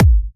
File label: Bassdrum